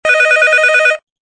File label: Ring; Nokia; 6750 Mural; Ringtones